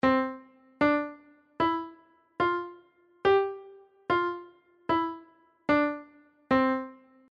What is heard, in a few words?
staccato, accent marks